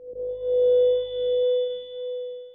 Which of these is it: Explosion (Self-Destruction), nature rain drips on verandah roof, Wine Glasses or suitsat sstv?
Wine Glasses